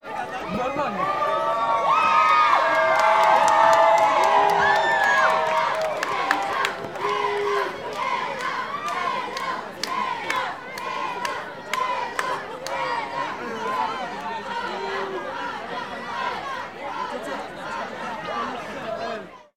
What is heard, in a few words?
Applause Young